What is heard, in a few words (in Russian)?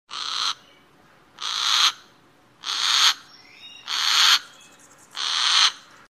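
сокол рычит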